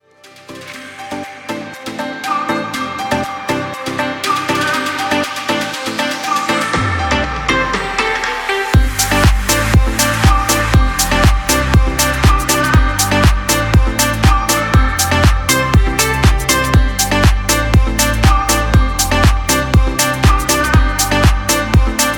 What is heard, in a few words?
Fresh Deep House Ringtone